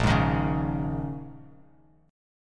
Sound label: Bb Minor 11th, Bass Standard, Bbm11 chord; strummed; JGuitar